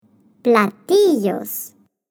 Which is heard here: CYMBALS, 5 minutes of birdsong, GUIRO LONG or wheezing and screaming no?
CYMBALS